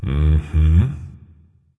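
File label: non verbal agree, voice; cernunnos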